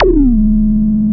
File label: DRONEZAP; Back In Time Records; Big Bang